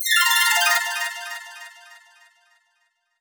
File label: Fill Arp; lead arp chord melody